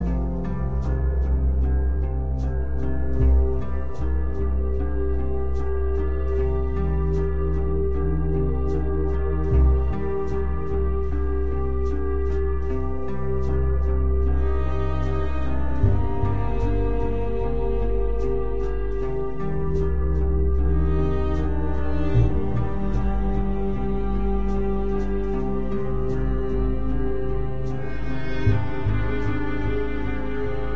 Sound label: Loop Folk; the walking dead season one gamerip 2012